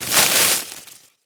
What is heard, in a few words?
vines; SnootGame